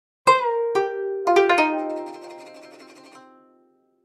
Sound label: Dialogue Box (Gayageum); B Path of the Teal Lotus